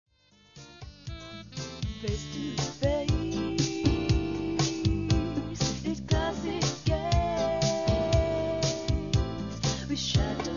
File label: smooth